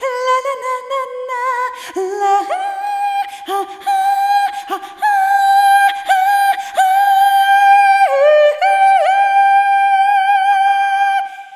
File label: Arrhythmic G